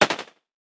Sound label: attracted; sound effects